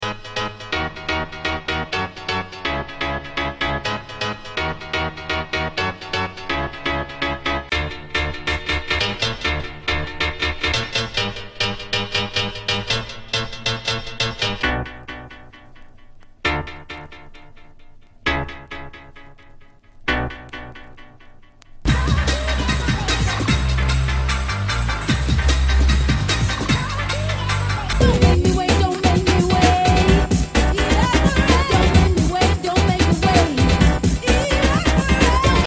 sound samples, piano

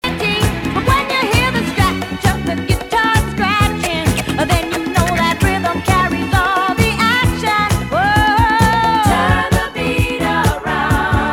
scratching; first use of scratching on a record

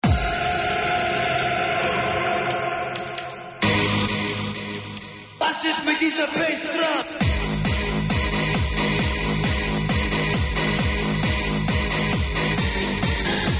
techno

